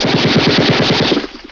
hm chgun; PC Gamer IT CD 32

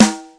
snare

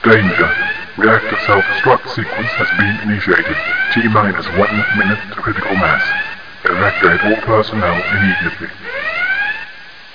whistle; Total Chaos Nukem 3d Pack

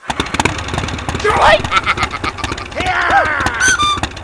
Helicopt; GEOGRAFIA

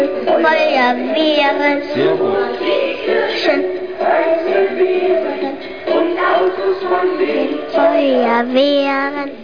Disco

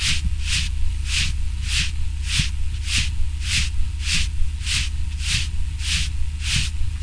movement; CD Action 28b; east front

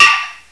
hammer klat; NETSTORM

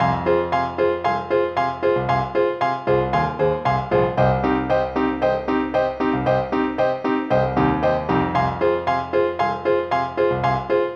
Reggae

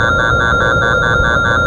growl lock; Falcon 40

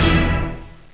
orch hit

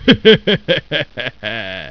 DEFEAT; Monster Media Number 15; os2

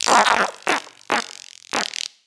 scarab die; DAoC

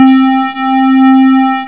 SQUARE WA

